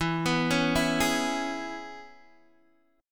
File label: E 6th, Guitar, DADGAD